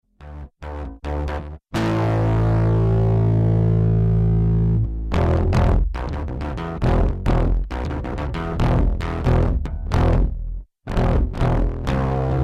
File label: Bass